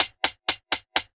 L-39 spark plugs, aircraft; DCS

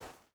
footstep burlap sand walk; Rust

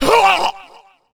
hurt medium

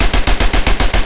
loop vickers303 origin; guns tanks

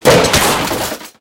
bollard crash; tanks; War Thunder

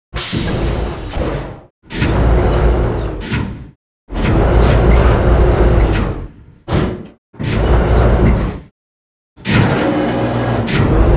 cannon idle

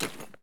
helmet pickup; Escape from Tarkov

